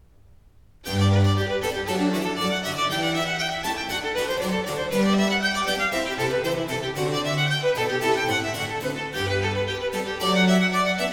Sonate 6 in A major 3. Allegro; Arcangelo Corelli; Corelli - Complete Works; Klassika

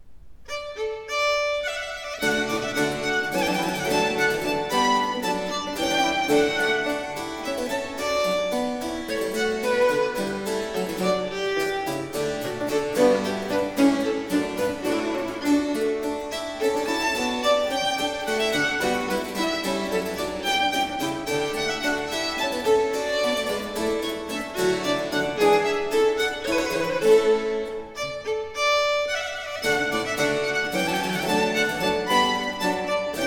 Sonate 11 in E major 4. Vivace; Arcangelo Corelli; Corelli - Complete Works; Klassika

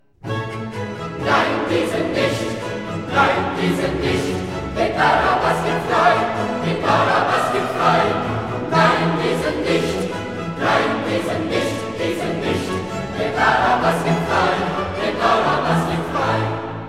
Coro; Nein, diesen nicht; Klassika; Handel Masterworks

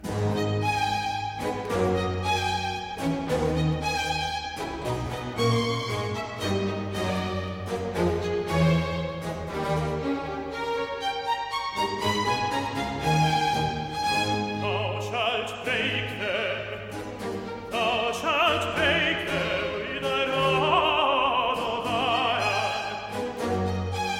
Aria-tenor; Handel Masterworks

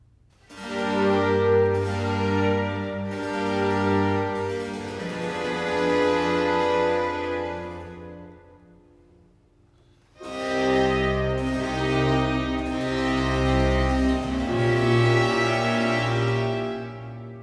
Concerto 10 in C major 3. Adagio; Arcangelo Corelli; Corelli - Complete Works; Klassika

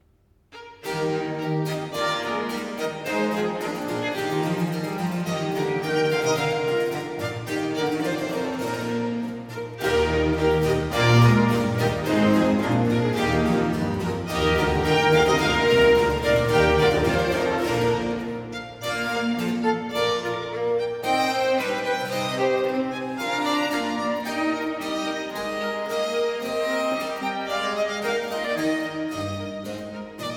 Concerto 9 in F major 4. Gavotta, allegro; Arcangelo Corelli; Corelli - Complete Works; Klassika

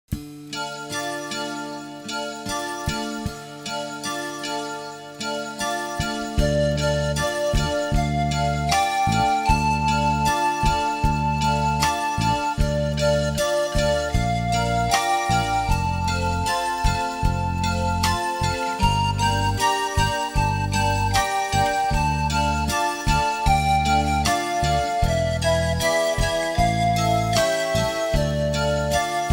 karaoke

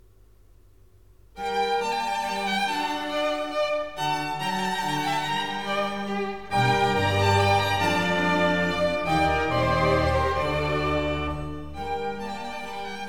Vivace, Conciertos Grossos; Concerto No.8 in G minor 'fatto per la notte di Natale'

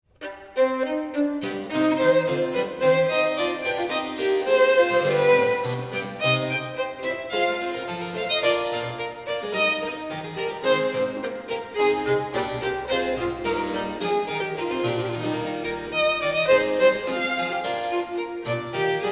Sonate 7 in D minor 1. Preludio, vivace; Arcangelo Corelli; Corelli - Complete Works; Klassika

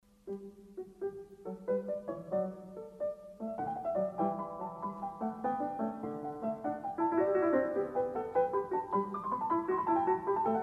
Bach Goldberg Variations

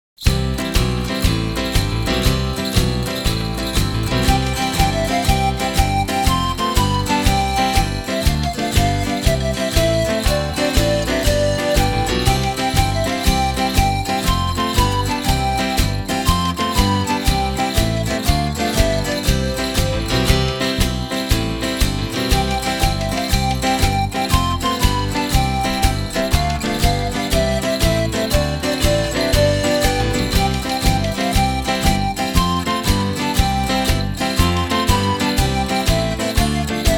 karaoke

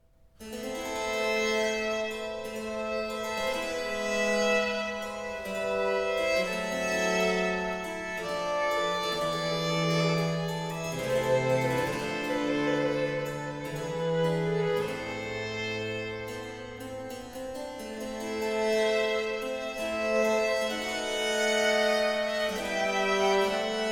Sonate a Quattro in D major - 3. Grave; Arcangelo Corelli; Corelli - Complete Works; Klassika

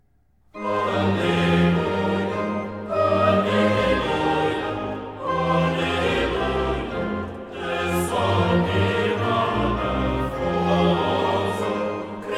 Choral (Chor); BWV 66; Alleluja! Alleluja! Alleluja!; Klassika; Bach, Johann Sebastian; Cantatas; BWV 066 Erfreut euch, ihr Herzen

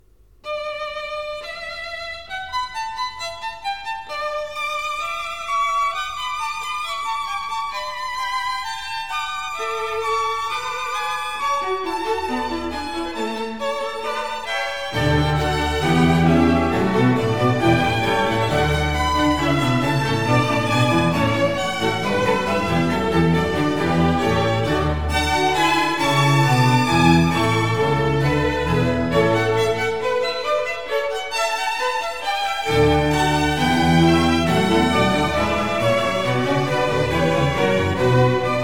Allegro, Conciertos Grossos; Concerto No.7 in D

